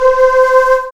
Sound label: SYN, dance; Sound & Vision - Gigapack II CD 1